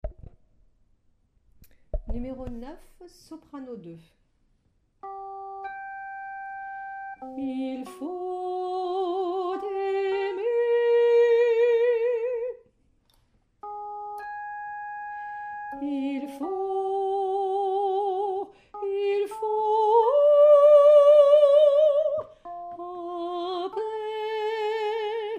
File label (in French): Soprano; pop